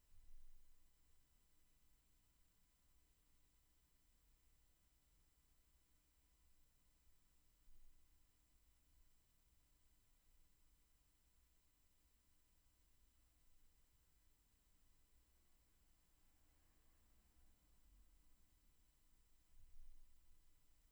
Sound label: roomtone